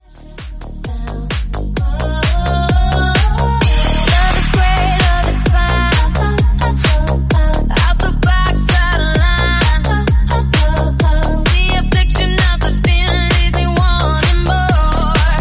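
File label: Tech House 130bpm E flat minor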